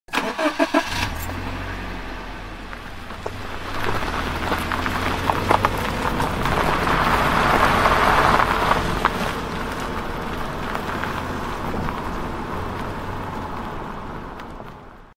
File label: parking lot